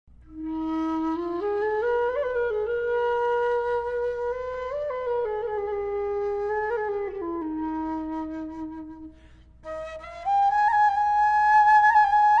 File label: Arabian Large, Arabian Flute